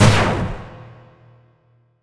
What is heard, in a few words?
drone shoot